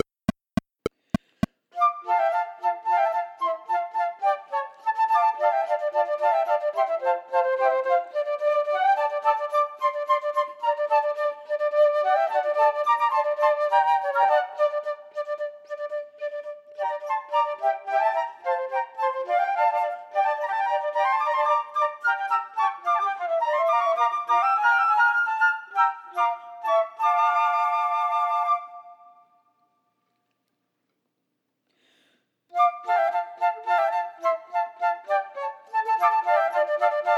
Quartet minus 4th part; Dvorak

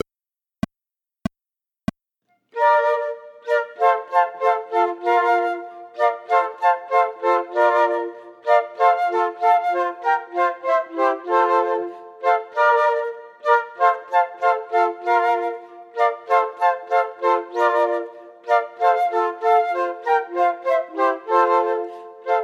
Gavotta minus 1st part; Corelli

